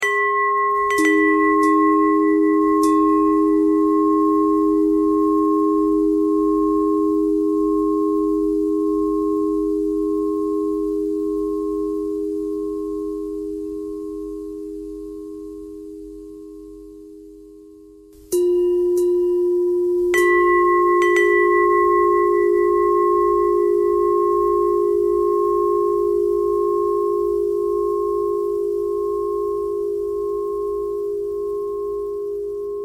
bell bell thread noise

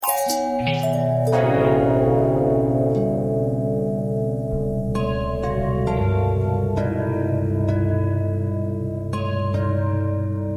bell long bell harmonic twist

